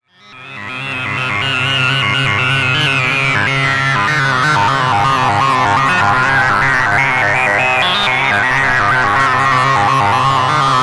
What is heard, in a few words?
Xaoc Devices Leibniz Binary Subsystem Wavetable Bank Vocaloid Driven by Tri